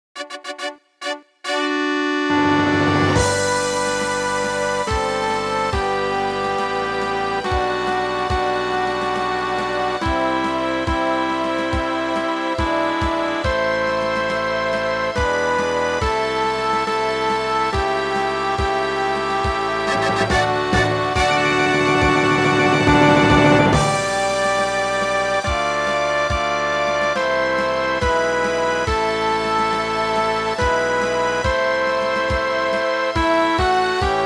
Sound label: Marshall Islands National Anthem